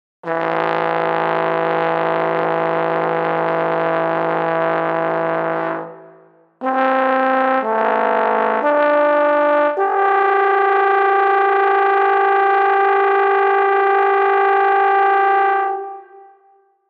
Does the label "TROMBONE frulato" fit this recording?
Yes